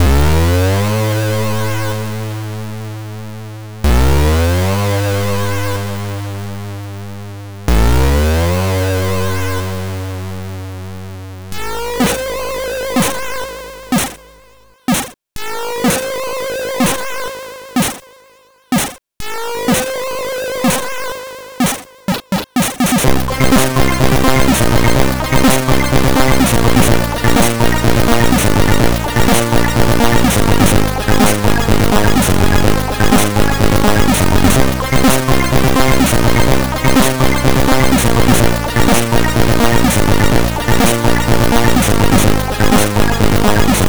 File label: c64 loader; ocean